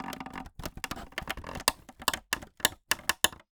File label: Polystyrene Creak